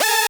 synth

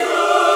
choir

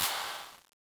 clap; Angelic Layer

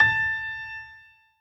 piano echo

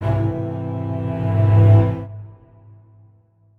strings

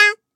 Vo Main

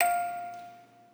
musicbox

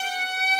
violin

